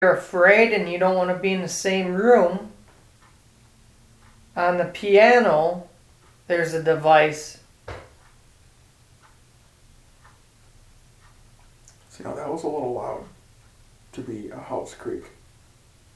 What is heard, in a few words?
knock; EVP